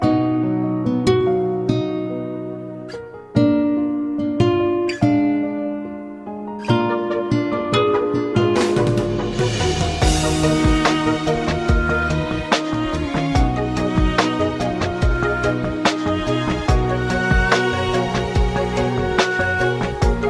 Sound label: Classical musi